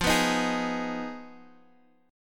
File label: F Minor 7th Flat 5th, chord; down; chord shape